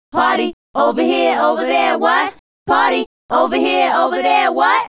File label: Female Group, Hip-Hop and RnB Vocals